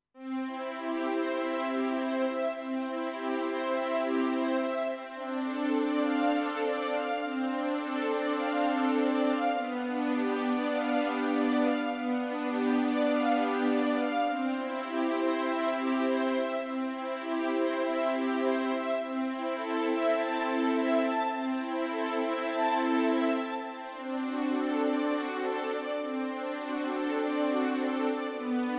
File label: Synth Strings